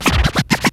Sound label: Frenzy Scratch; Proteus 2000; Digital Sound Factory Proteus 2000